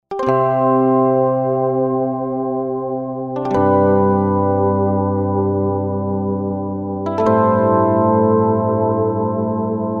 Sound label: Mono Rhodes Ep